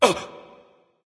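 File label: nonverbal surprised; smite; voicepack; ullr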